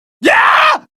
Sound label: short scream